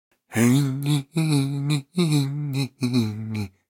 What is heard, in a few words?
woody large; alohaxmas